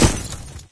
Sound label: jump landing, player